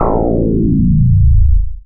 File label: Portal